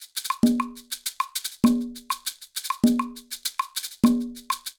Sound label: Charanga Maracas Wood Block 200bpm, Latin Percussion; Ableton; Factory Packs